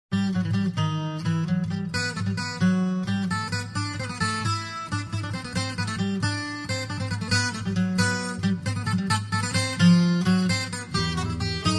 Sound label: Tango barroco